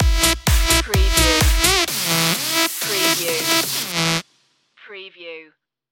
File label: club dutch stinger